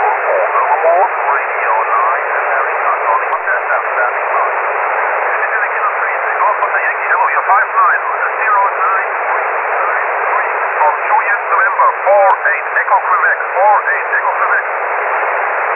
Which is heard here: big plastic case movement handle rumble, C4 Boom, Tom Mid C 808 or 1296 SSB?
1296 SSB